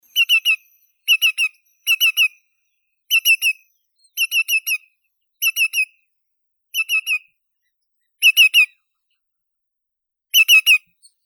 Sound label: ulit pestrii tringa melanoleuca